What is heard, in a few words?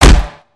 Spider Get Hit